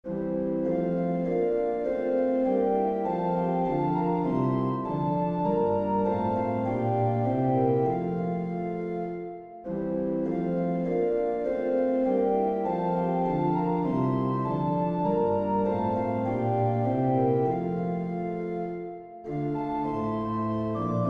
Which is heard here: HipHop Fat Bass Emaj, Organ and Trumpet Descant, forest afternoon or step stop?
Organ and Trumpet Descant